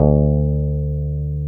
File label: NASAL BAS, Electric Basses; PlatinumPhattCD2; E-MU Producer Series Vol. 8 – Platinum Phatt